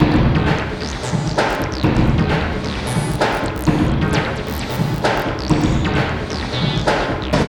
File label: LOOP, Tekno Industrial; East West